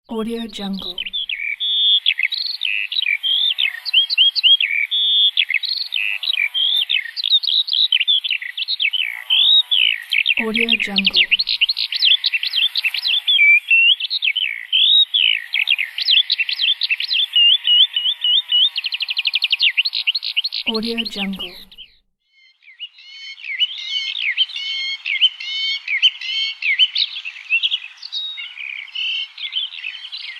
forest ambiance pack

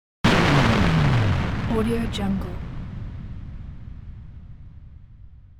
intense explosive video game sfx

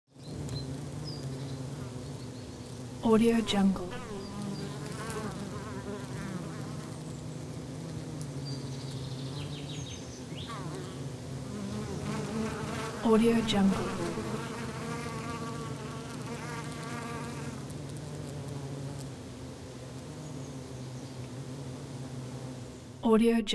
atmo nature countryside many bees; smartsoundfx

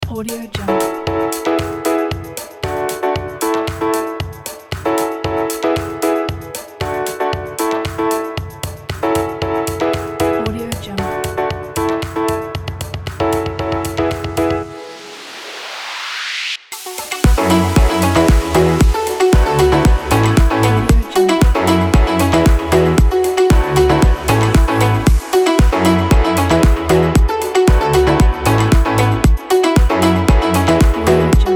tropical summer pop; dudesound

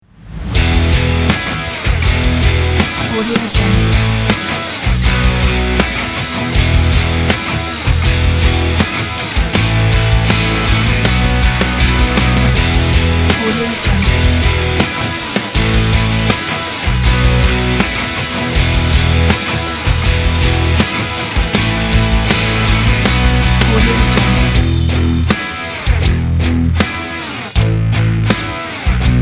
strong rock

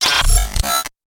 hitech electronic buzz

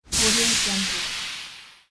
steam

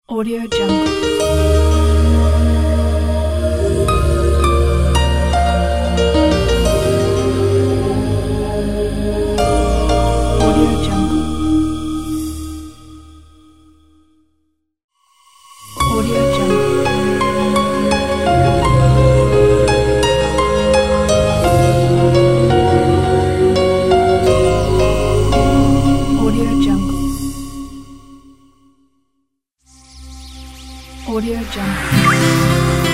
inspiring logos pack; noobtorio

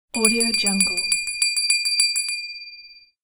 hand bell mid

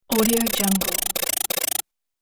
scifi loading bar

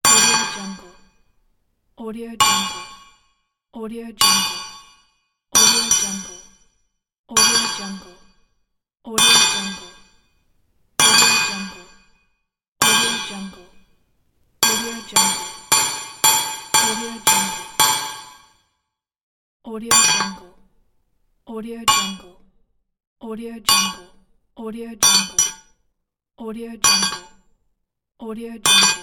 working with metal

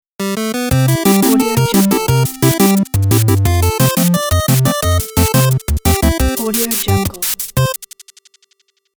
8bit logo

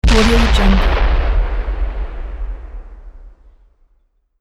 small rocket explosion; sound ideas

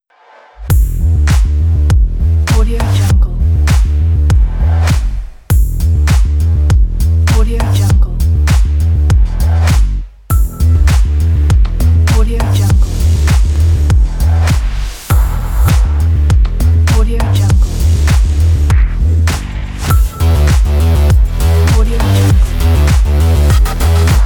technology robotic; angelitemusic

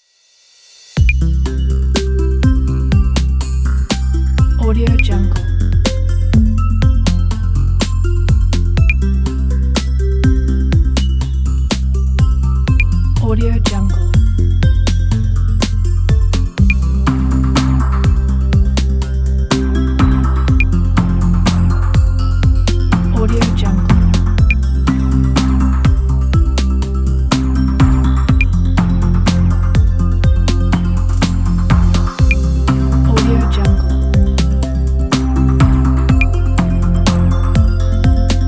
background tech

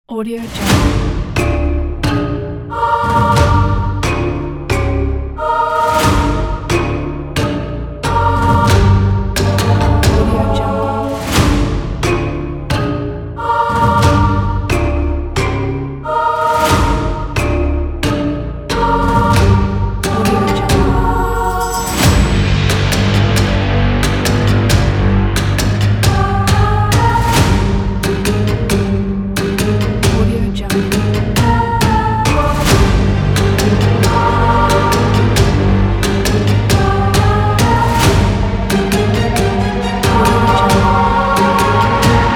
fear

